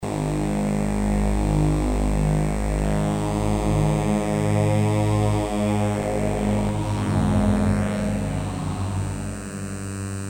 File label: Drone; Landscape Noon